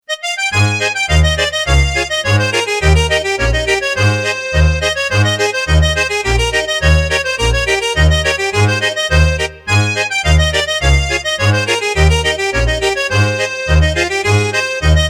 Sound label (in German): Schottisch